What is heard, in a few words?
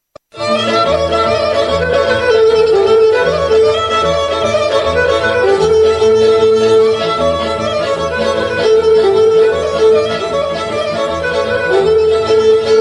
Horo; Bulgarie